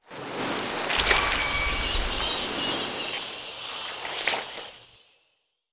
TNT; KRONDOR

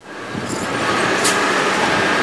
BUS STOP, MACHINES

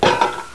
DROP TIN

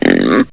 PIG; JOHNNY

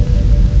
saucer; ParadroidII

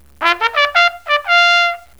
CHARGE

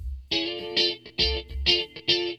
GUITAR, ROCK POP; MAGIX

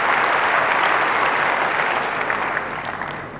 CROWD

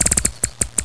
SQUEAKIE; ANIMALS

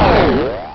stargun; alienfrenzy

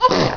sneeze

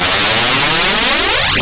build up; HIGH TECH

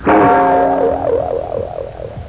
BOINKIN

